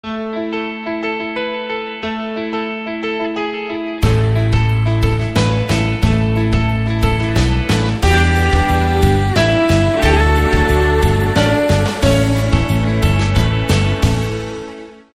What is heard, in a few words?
ringtone; Pannon